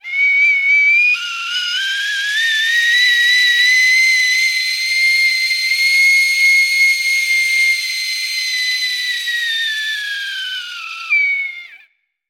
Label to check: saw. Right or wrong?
Wrong